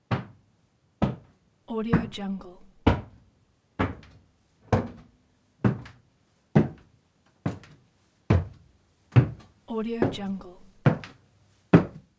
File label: footsteps walking wooden stairs up slow; soundjay